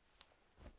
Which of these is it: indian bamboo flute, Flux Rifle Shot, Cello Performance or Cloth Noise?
Cloth Noise